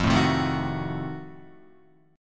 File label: F 7th Flat 9th F down Guitar; F7b9 strummed